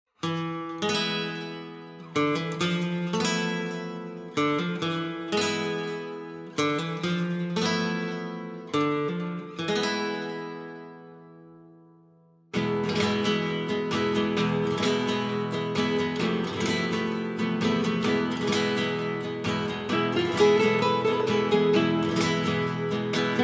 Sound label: Amazing Chill Guitar; CHILL OUT SUAVE